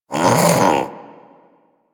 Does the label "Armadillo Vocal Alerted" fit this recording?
Yes